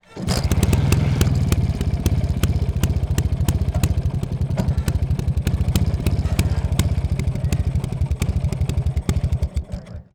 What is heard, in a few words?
Rajdoot, motorcycle, kick, start, revs, idle, exhaust POV, Yamaha Rajdoot 175cc; Bombay Sonic